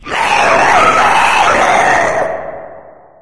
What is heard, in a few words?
stalker scream; npc; source engine; episodic